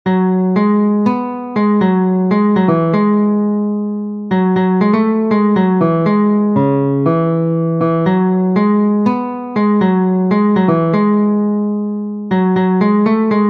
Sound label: Guitar